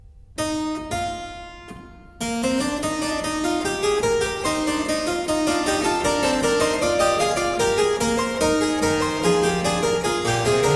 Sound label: Fuga, Keyboard Works, The Well-Tempered Clavier; BWV 854 E II; Klassika; Bach, Johann Sebastian